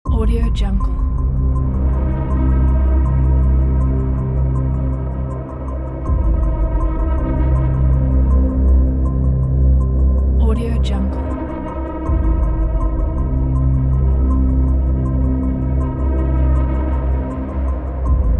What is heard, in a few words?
dark suspense background atmosphere